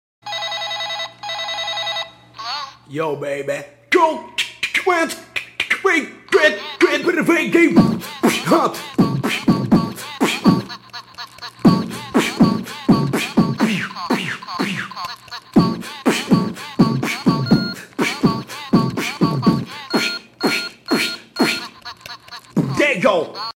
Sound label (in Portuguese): beat celular